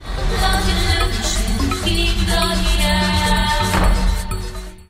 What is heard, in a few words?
join